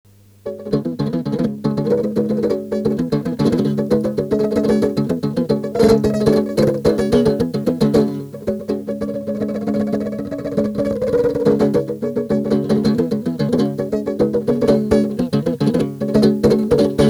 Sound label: guitar; prepared acoustic guitar III; solo guitar; acoustic guitar prepared with paper; faster tape speed